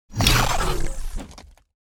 swing, sword; bane

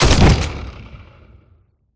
fire, weapons

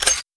rifle slide back; br85

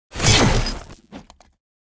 impact, sword; bane

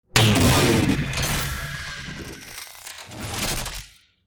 shot

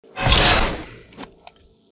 melee

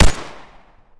gunfire; weapons; br85

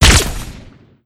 fire, weapons; ppistol

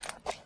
close binoculars

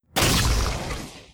shot

